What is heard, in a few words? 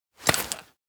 gasmask receive; anemoi